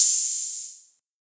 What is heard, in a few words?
nice open hat sound a key, Royality free open hi hat tuned to the A note. Loudest frequency: 8342H